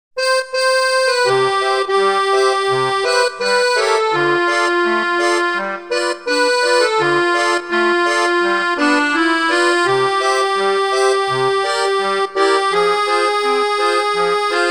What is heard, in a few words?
O Sole Mio Trad. Italian; Soundzabound Volume 2